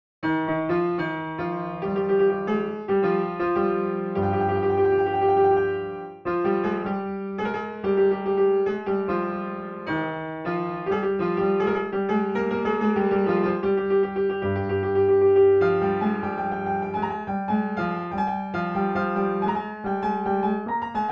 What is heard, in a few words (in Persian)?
Zabol, piano; Segah; Radif Mahjoubi